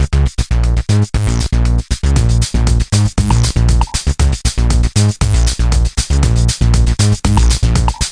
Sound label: Electronic; American McGee Presents Bad Day LA